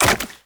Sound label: Wall Jump Goo; Footsteps; WallRun; Warframe; Characters; Tenno